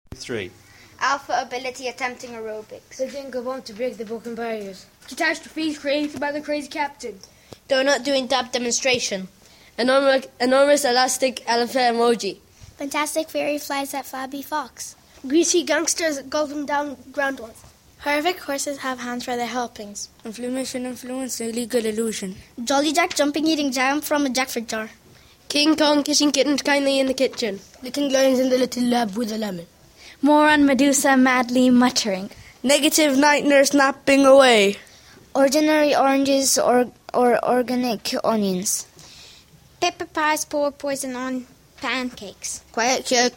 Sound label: crazy class rap